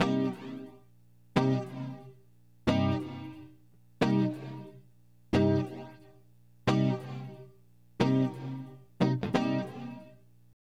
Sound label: GTE, Hip Hop and R&B; Best Service ProSamples vol.02; AKAI